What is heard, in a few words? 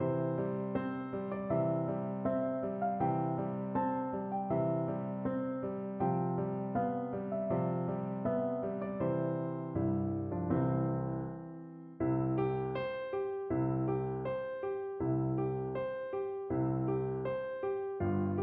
long long ago FL kar, Flute